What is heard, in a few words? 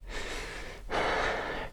Player UI, sfx; soma; Five Nights At Akers